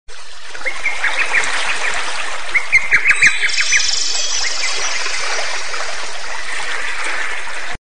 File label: Ocean Waves And Birds